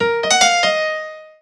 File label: piano; gesource; DuClare Chateau